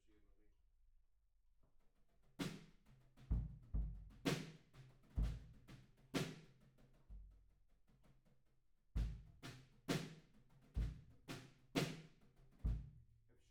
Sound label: Room